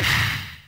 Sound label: Punch; RedDot